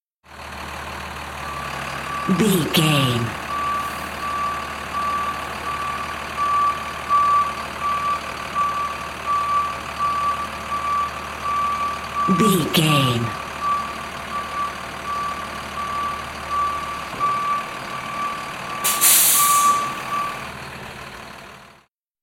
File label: truck engine reverse beep